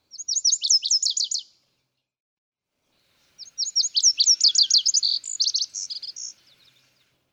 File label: Lazuli Bunting